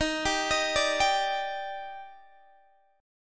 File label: Eb Major 7th, Guitar DADGAD; down